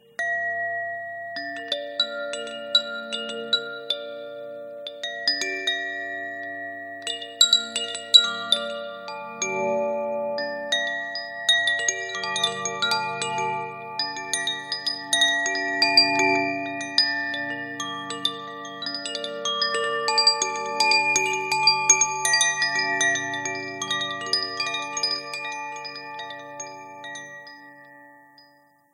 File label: Koshi Bells, bell alarm; Mudita Pure